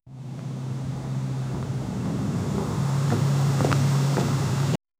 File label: soft road background noise